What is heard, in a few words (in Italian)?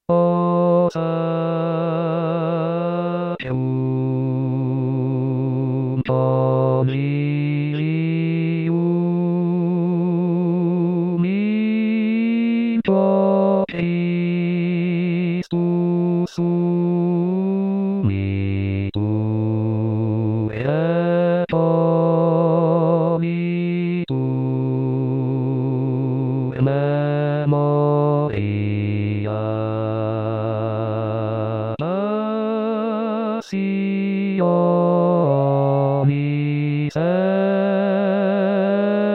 bassi; anno liturgico; O Sacrum Convivium